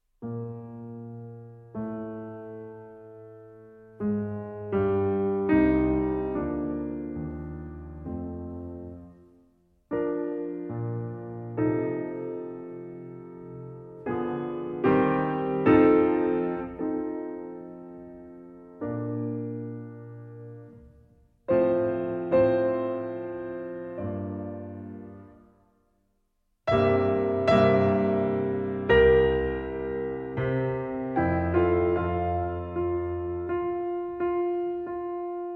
string quartet in b flat major adagio ma non troppo allegro